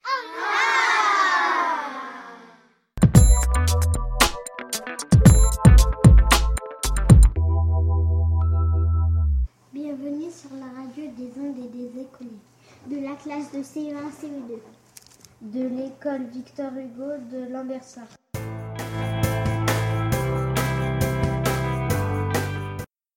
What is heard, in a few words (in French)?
jingle